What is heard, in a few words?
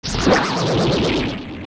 Big Deku Baba Die; zelda; OOT